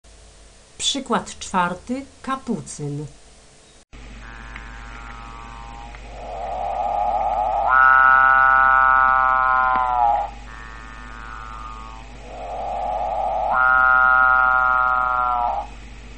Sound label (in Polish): kapucyn, ptaki